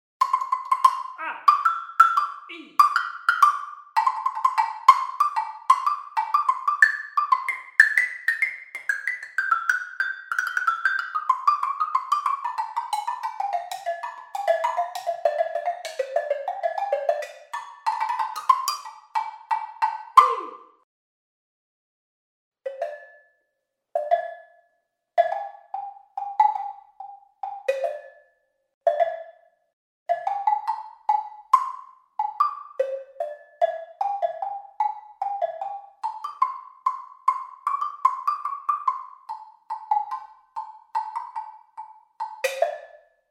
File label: squash forks bells